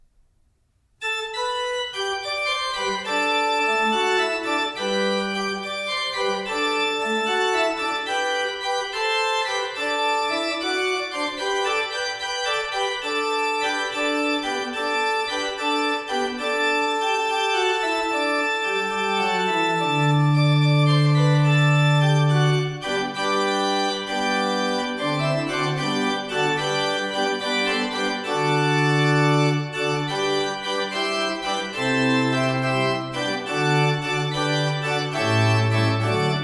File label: Closing Organ Music; Marching to Zion; Pioneer Memorial Church; Kenneth Logan